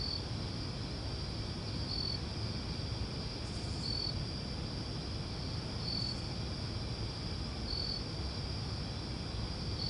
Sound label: night crickets; ambience; AssaultCube